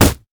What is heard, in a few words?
mech melee basic attack; minions; LoL